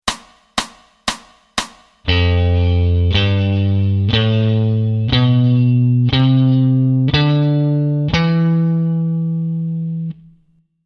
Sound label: G Mixolydian 6th String